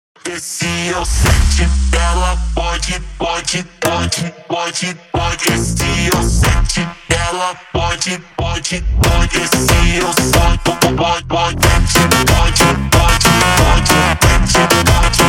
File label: Montagem slowed